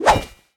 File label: auto swing; pirate; Smite; Sobek